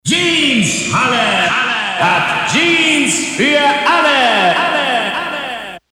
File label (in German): YH Jingle lang